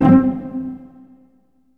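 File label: cello pizzicato C3, Virtuoso Strings; ILIO; Kirk Hunter Virtuoso Strings VOL-3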